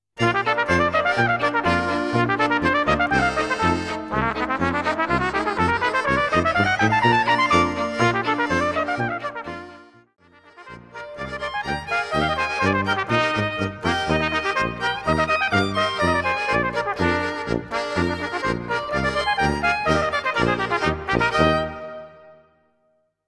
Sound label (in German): Schottisch